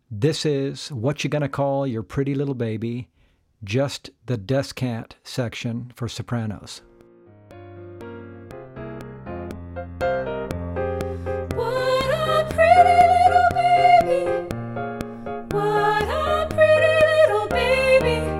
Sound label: DESCANT ISOLATED; practice tracks